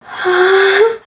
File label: female orgasm